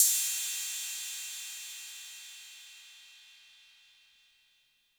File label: Cym B 808 Tape Decay C; Cymbal; Clean